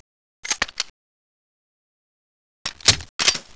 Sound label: laser gun